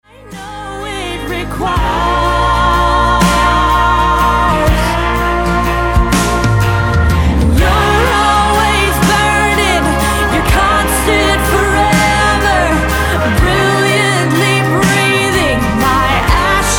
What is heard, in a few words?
DRUM BOUNCE